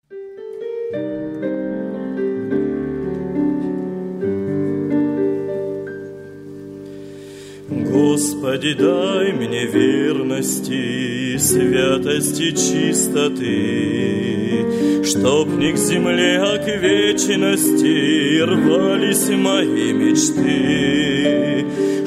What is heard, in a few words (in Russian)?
penie; Krasnodar youth camp